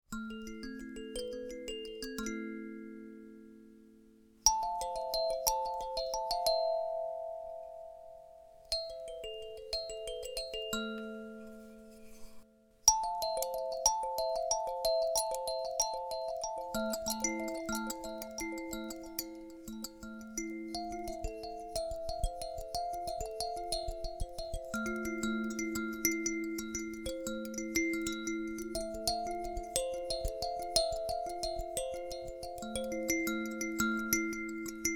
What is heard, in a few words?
Twin Sansula